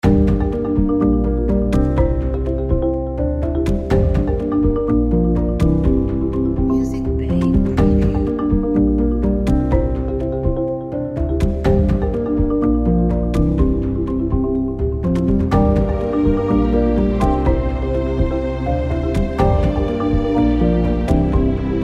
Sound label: Marimba Documentary Cinematic Background